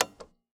Typewriter

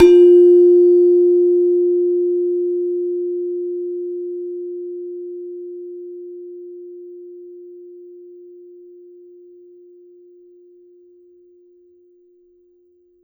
Gender, Gamelan

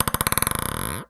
Ping Pong Ball Bounce; Balls

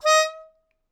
Melodica Short; Short Notes Chromatic

